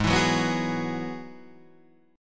G sharp Minor 7th Sharp 5th G sharp down Guitar Drop D